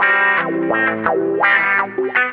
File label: GUITAR